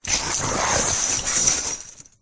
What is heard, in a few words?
hospital ward cubicle curtain open or close